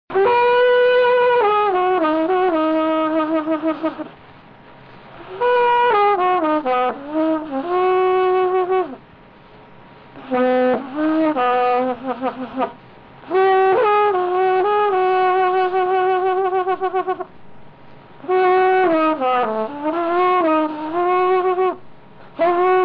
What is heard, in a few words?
solo